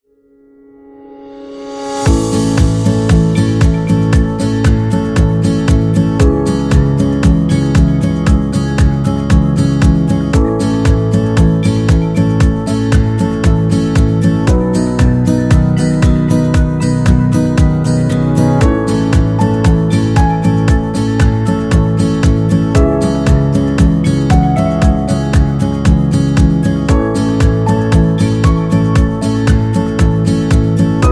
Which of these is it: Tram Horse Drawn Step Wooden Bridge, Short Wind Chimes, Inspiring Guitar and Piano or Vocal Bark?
Inspiring Guitar and Piano